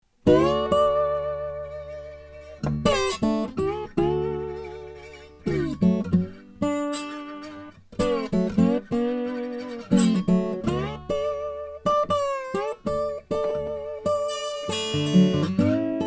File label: martin 000 18 mahogany; 1939